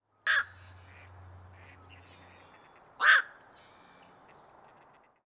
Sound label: black crowned night heron, bird songs